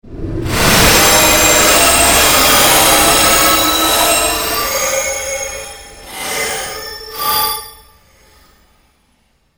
surge fluctuation fade, Sound effects created by manipulating other sounds; Rise And Dive Sound Effects; Rises and Dive